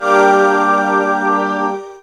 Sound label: synth, g, Techno Chords; Dance Synths